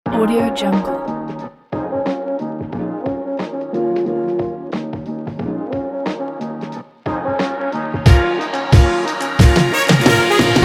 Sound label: jazz lofi hiphop